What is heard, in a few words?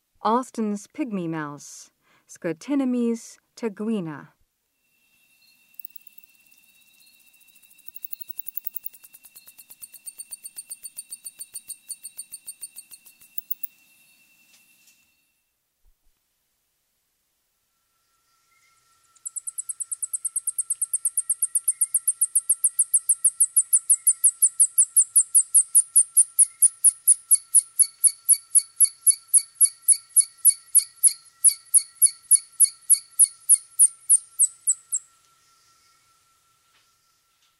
Austin's Pygmy Mouse, Mammals of the Rainforest; S. America